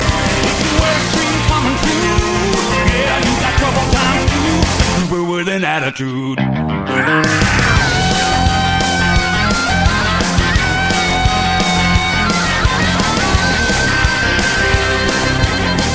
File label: Attitude Instrumental Ringtone